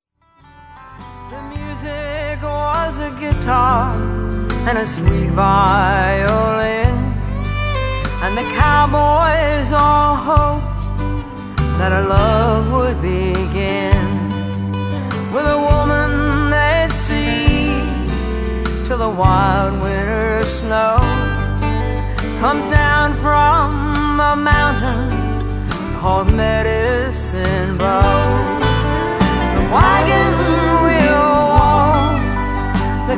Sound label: Waltz